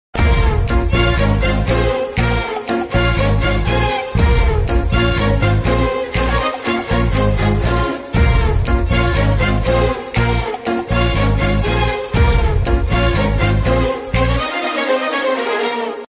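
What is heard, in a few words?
background music theme ringtone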